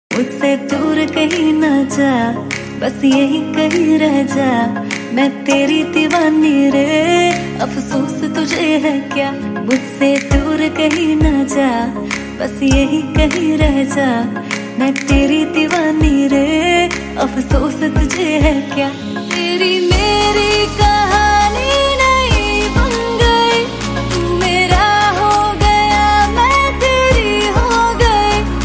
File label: Female Ringtone